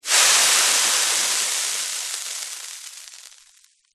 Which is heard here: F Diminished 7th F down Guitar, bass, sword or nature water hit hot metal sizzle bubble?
nature water hit hot metal sizzle bubble